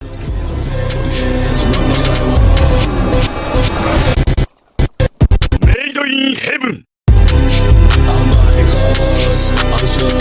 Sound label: woaaaahooooo